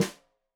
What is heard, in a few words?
Snare Stereo, Drums; Deeply Sampled Drums